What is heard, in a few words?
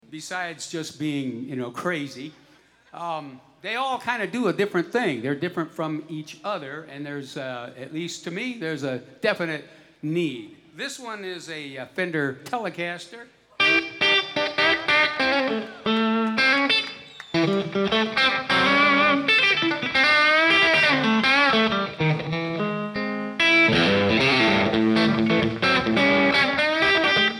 Guitar Moment